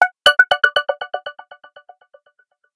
Doorbell Synthetic, ringtones; Motorola Droid3

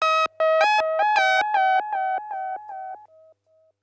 alarm signal; Sony Ericsson; ringtones

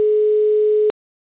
ringing tone, Motorola; Q9h

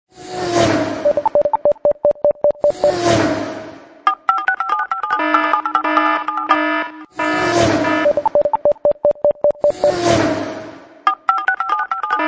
Ring Speedy; Asus P526

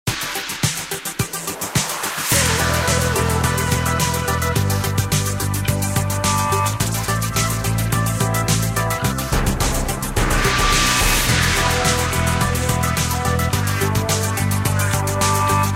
Digital cloud, ringtones; Samsung; GT-B9062

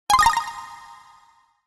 Alert Sparkle; HTC Touch Pro2

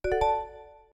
New Mail Vip, notifications; Asus Zenfone-10

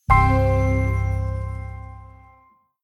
shutdown; Samsung GT-I5800 Galaxy 3

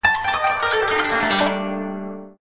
orient, notifications; Sony Ericsson Xperia Play

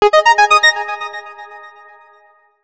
Digified Slide; PlusFourSix; Lars Edvall

